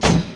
shld hit; DAoC; Toa; Gamestar

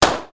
tile drop

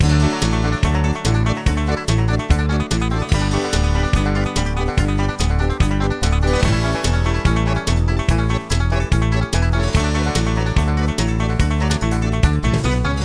Music; CyberMycha 01-2004 (Poland); Klik

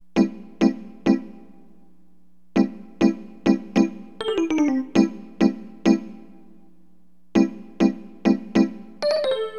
RHODES; LiveAct Pool; AcidJazz Funk; Keyboards

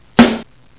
key

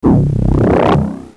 Magic; mordor

